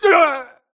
grunt death neck; Titanfall 2

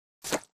bullet light ballistic mud debris; Titanfall 2

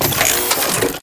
Servo Jog; Titanfall 2

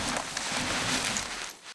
wounded drag; Titanfall 2

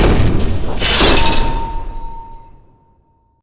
Cargo Elevator Up Stop, Warehouse; Prop Warehouse; Titanfall 2; Boomtown

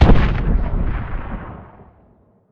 rocket explosion far; Titanfall 2

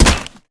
bullet light ballistic spectre; Titanfall 2

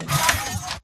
Mvmt Servo Light Medium; AI Stalker; Titanfall 2

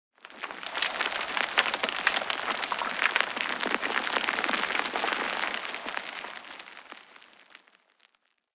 Rock Debris Small Long; Boomtown; Titanfall 2

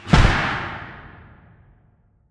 Assembly Conveyor Stop Close; Boomtown; Titanfall 2

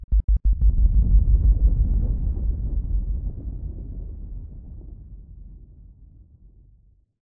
Explosion Rumble Exterior Close LFE; Boomtown; Titanfall 2

